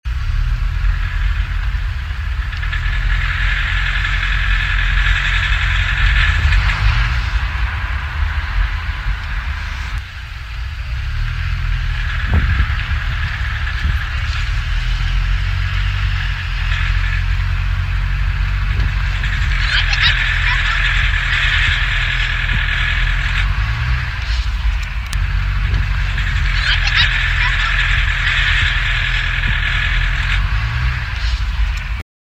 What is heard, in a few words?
loud rattle light acceleration civic hybrid rattle